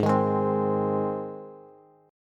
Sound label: Ab Major, Bass; strummed; JGuitar